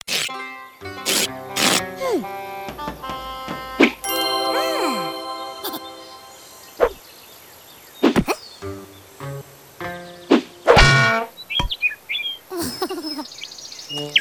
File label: Medieval Gold; Worms Forts: Under Siege